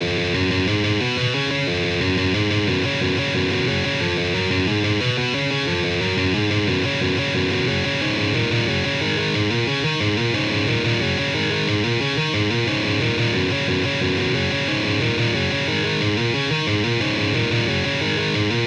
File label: guitar